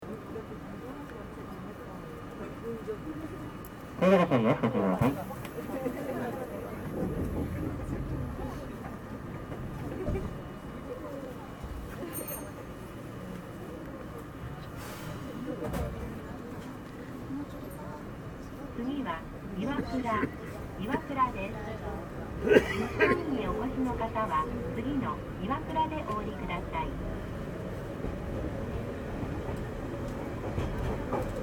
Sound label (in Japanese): 800系, デオ800系です。吊かけからカルダンへ改造された独特の走行音です。; 叡山電鉄; 800系 鞍馬線八幡前〜岩倉